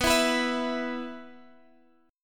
E 5th B

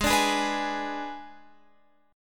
G sharp Diminished G sharp, Guitar Open F; G#dim strummed; JGuitar

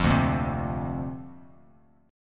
D-Minor 7th Sharp 5th D Bass Standard, chord; Dm7#5; strummed

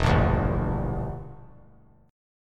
E Minor 7th Flat 5th E; strummed

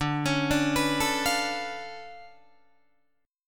D Minor Major 13th, down, Guitar, DADGAD

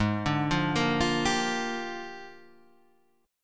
Bb 13th Ab

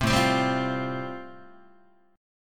A-Minor 7th Sharp 5th, Guitar, chord; 3,5,3,5,4,4; Am7#5 strummed; JGuitar

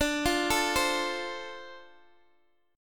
D-Minor 7th D Guitar Open D, chord

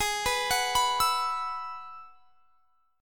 Ab Minor 7th Sharp 5th Ab, down; Abm7#5; arpeggiated

